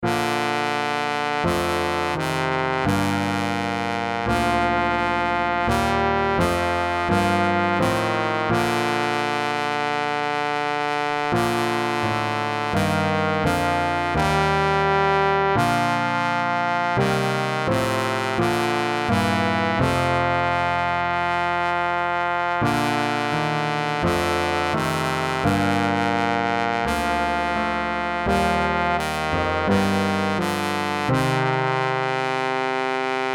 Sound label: solo trombone; Solo Tenor Trombone